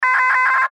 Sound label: Radio Morse Code; Morse Code Weak Signal Number Eight; Warfare; Blastwave